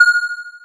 Small Message

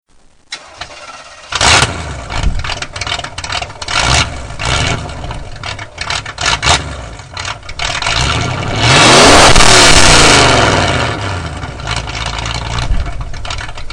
Camaro 84 Ss Start

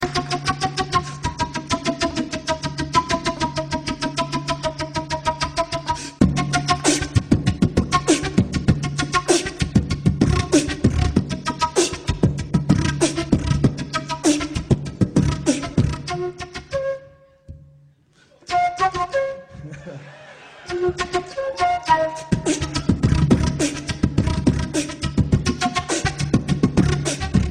Flute Box

